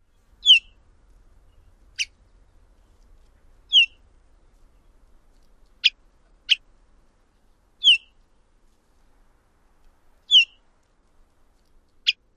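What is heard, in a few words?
calls, olive warbler; AZ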